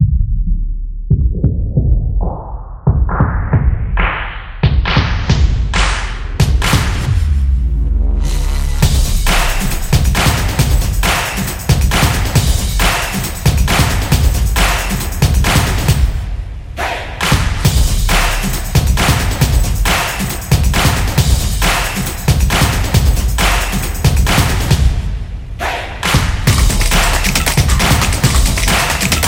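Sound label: Text Opener, Football percussion background music inspired by samba rhythm, stadium energy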